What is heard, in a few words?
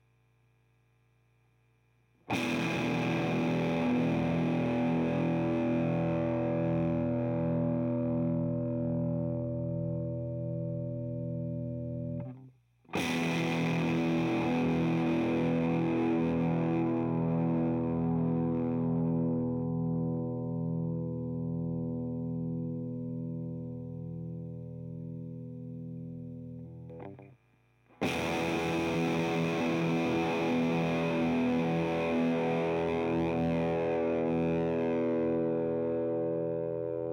less gain more volume; kma fuzzly bear